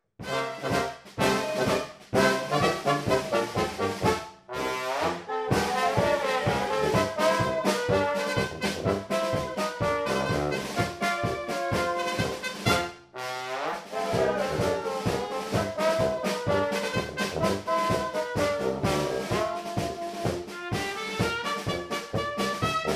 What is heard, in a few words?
polka